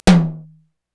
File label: TOM, Korg Electribe EMX-1